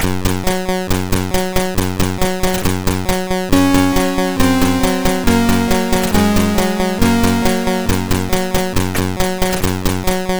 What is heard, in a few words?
Unknown Sound, sid MOS6581R2